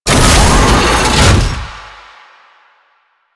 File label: Tank Turn Full, Movement; Warframe; Lasrian Tank